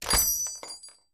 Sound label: Bells Shop Jingles